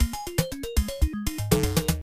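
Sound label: background music; Block Block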